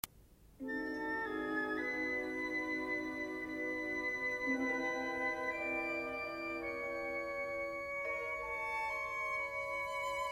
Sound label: piano & orchestra Andantino; Caprices on the name Schonberg